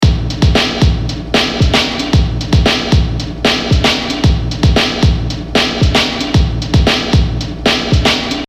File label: breakbeat